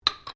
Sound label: JAR GLASS LID METAL PLACE BENCH; Various kitchen jars; Set Downs; Objects